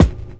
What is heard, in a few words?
studio kick drum sample e key; kick drum samples